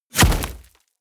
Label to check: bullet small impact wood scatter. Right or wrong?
Right